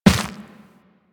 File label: Run Dirt, Footsteps; Warframe; Operator